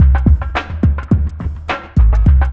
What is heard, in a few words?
LOOP, DRUM; E-Lab; X Static Goldmine 2; akai